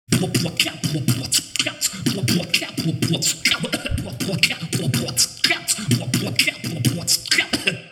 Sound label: beatbox 16 beats